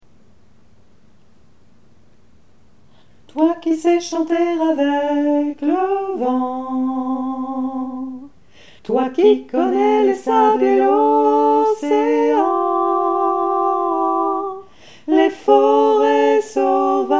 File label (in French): la terre soprane alto